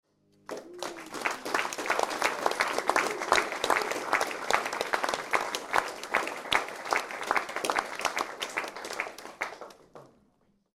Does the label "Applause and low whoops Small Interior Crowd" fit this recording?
Yes